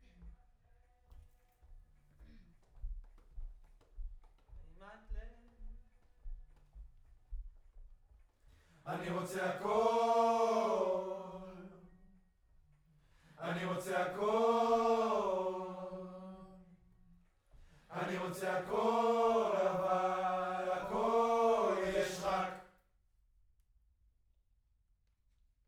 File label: Choir Far